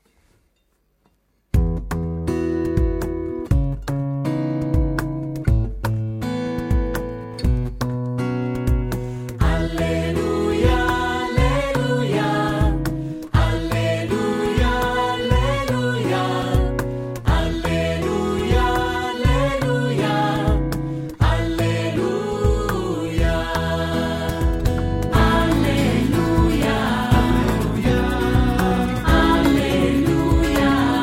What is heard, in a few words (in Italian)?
alleluia voce contralto